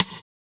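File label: Kawai ACR-20 snare drum rim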